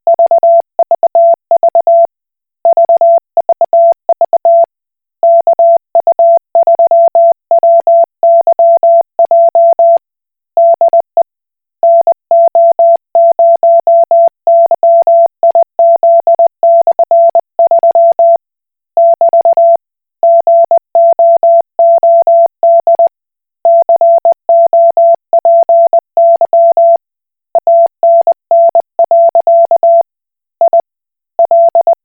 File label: Koch Morse